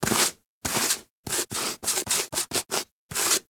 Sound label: Foley Dig Scratch; Enemies; Kubrow; Warframe